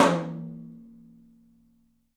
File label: TOM, Studio Toms; 3D Percussives